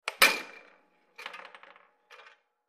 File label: Air Hockey Puck Hit